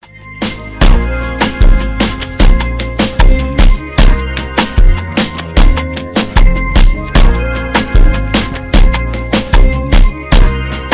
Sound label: funk slowed instrumental